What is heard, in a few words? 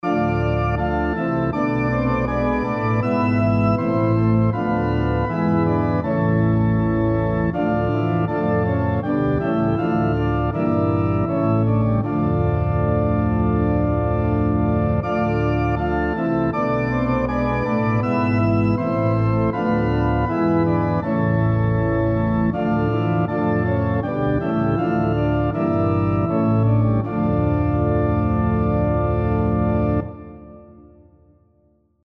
SATB on Piano Example